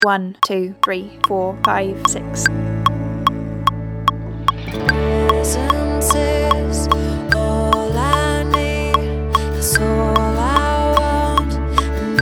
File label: drums track